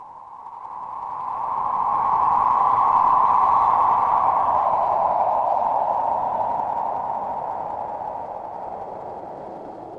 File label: horror wind whisper